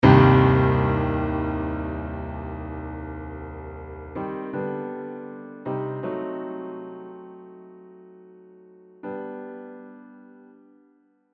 Dynamics, The first chord is hit with a high velocity of 105 to be nice and loud. The rest of the phrase tapers off from 45 down to 30.